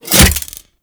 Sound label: Throwing Taser Sticky Bomb Adhere; Tenno